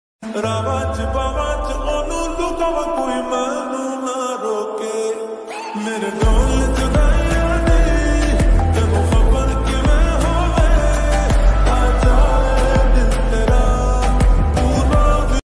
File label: lofi ringtone lofi song ringtone